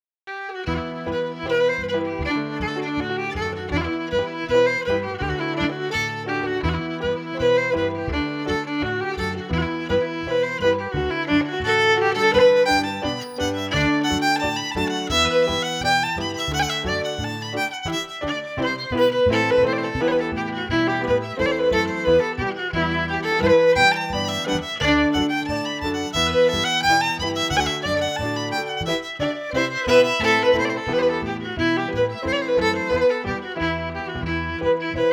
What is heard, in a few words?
FIDDLE PIANO; Jink & Diddle Book o' Tunes 2022